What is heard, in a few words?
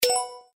coin